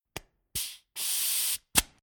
Industrial Air Tool Connector Coupling Disconnect Then Reconnect Air Release; Hisse